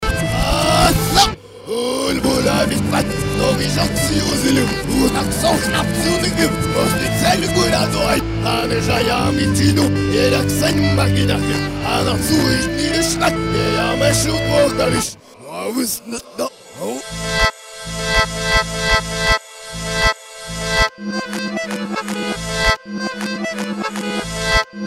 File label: gopak reverse